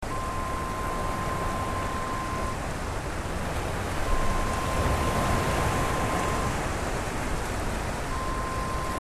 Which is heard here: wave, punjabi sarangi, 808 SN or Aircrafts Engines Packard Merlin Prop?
wave